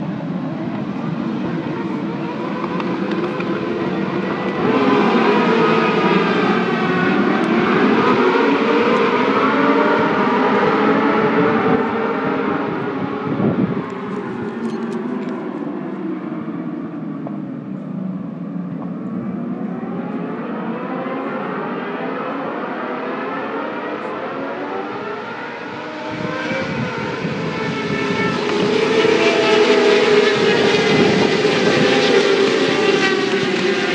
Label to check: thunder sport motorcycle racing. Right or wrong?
Right